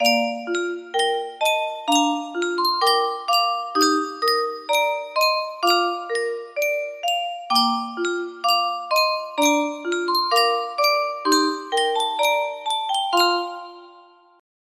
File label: music box, music box melody